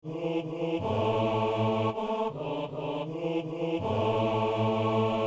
hommes

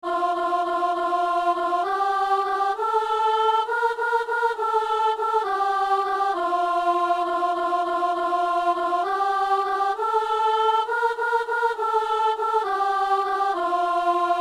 alleluia, soprano; Messe coutrillonne

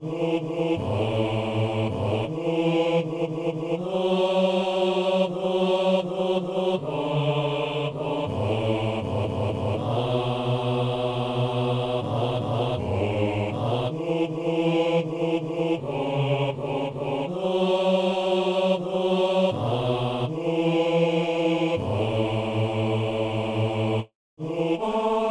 basse

